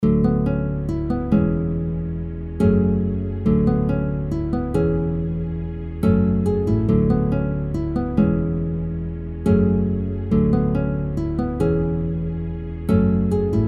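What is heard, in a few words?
F minor 140 BPM Guitar Strings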